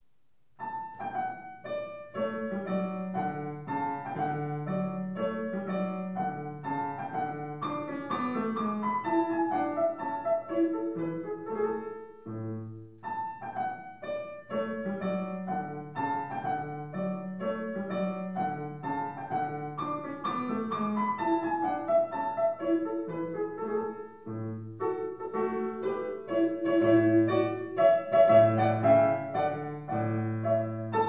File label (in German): Menuett D Konzertfluegel; mozart